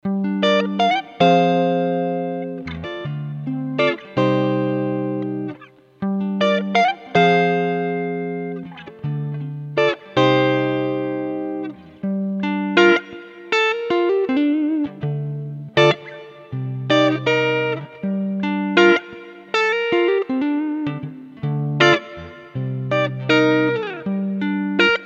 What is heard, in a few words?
Easy Guitars